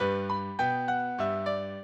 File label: minuet, mozart